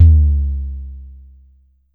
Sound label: Drum X Sub, Pearl Drum-X